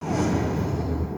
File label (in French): RAYON